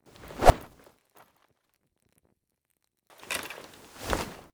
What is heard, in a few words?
Arrow Glove